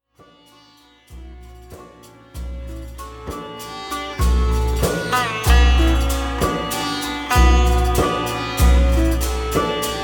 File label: Water Fall, sitar